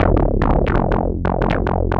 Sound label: Bass Line; Eclectic Electronica